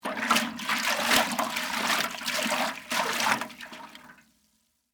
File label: Water Slosh Bucket, Water, Slosh, In, Metal, Bucket, Large; Splashes; BlastWave